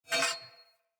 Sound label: Short Sword Whoosh Accent; Weapons; Player